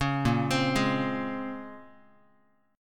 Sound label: A sharp Minor 9th, A sharp, down, Guitar; arpeggiated; 4,6,3,6,x,x